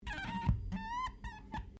Glass Squeak Rubber; Material components for constructing more complex sounds; Materials; Foundation

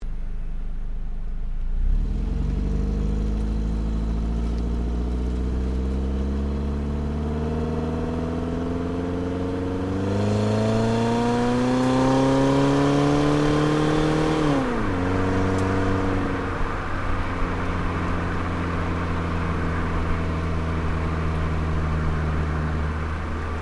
Car Ford Focus Accel Drive Exhaust; Ford Focus hire car; Cars; Vehicles

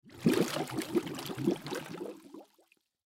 Bubbles Surface, Water Bubbles Surface Gurgle; Gargling; Voice; Blastwave

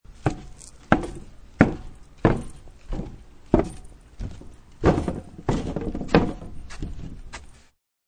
Walking on a dock; Footsteps on Dock; Footsteps (Sound Effects:Human)

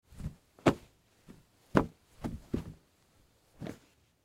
Rug Shake Dust, Rug Shake Dust Mat; Foley; Cleaning; Household; Blastwave

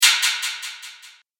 Magic Metal Delay Hits; Magic Sound Effects

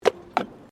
Tram Horse Drawn Horse Step Pavement; Horse drawn tramcar; Carriages; Vehicles

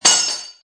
Metal Clamp Drop; Metallic; Metal sound 61 Clamp Drop; Sound Effects:Multimedia

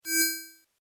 Tech rollover, Rollover: Short, High Tech Effect; Mouseovers; Multimedia

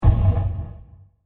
Deep Impact With Reverb; Low Impacts; Crafted Signals

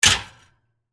Gate Close; Foley; Gate Sound Effects; Doors

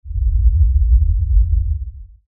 Lfe Rumble By Swell Low Bass Movement; Rumbles; Blastwave; Sound Effects:Sound Design

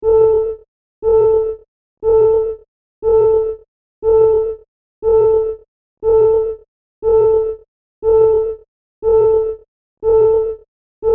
Alarm Drone Loop; Alarm Sound Effects; Andrew Fleming

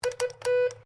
MORSE CODE U; Morse code aplphabete; Morse Code (Sound Effects:Electronics)

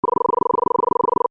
Computer Data Streaming Effect; Computer Sound Effects; Computers (Sound Effects:Science Fiction); Stuart Duffield

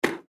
Arcade Golf Game Spin Wheel Short; Arcades; Blastwave

